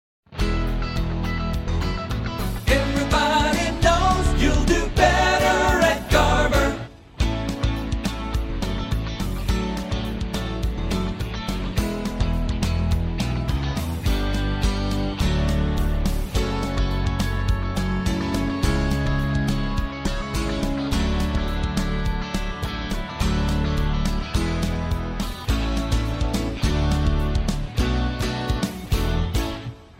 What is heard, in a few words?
Jingle Intro Sing Only; Garber